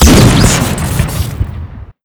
bfg sniper crit; tf2classic

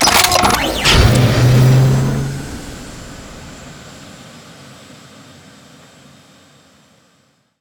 rocket pack boosters extend; tf2classic

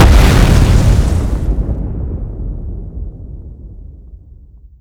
bomb explode; weapons; tf2classic

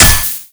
ubersaw hit, weapons; tf2classic

